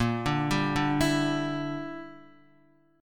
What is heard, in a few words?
A sharp 6th Flat 5th chord, Guitar; A#6b5 arpeggiated; JGuitar